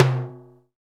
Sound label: Low Timbale; Percussion